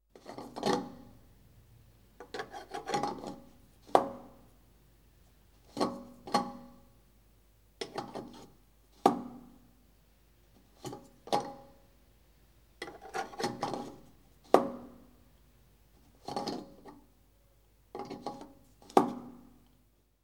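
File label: Fuel Cap Unscrewed; KMP Film Video Sound Effects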